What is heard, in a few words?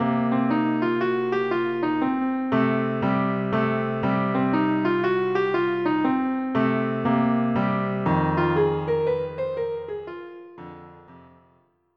JAZZ